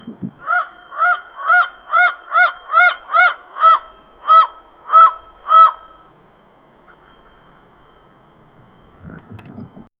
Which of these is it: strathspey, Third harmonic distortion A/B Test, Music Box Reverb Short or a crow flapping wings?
a crow flapping wings